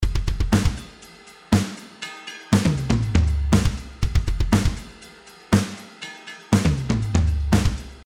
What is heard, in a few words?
DRUMS Fault